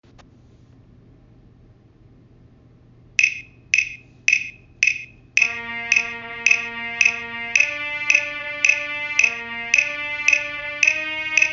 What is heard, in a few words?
tenderfoot trumpet